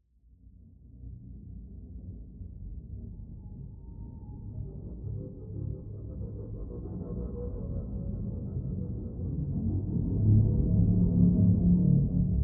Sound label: Dark Orch Pad; The Last of Us